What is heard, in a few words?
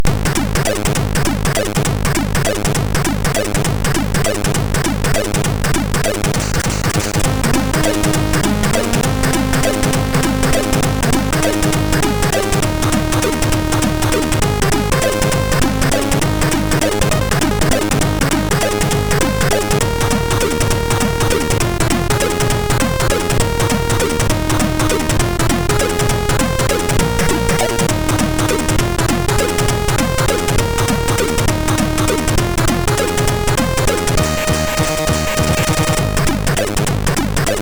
SPLASH, sid MOS6581R3; hvsc